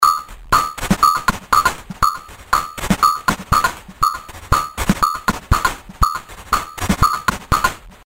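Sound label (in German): mit einem Verzerrer-Modul, Drumkit, Lo-Fi; Envelope-Follower; Steinberg Groove Agent 4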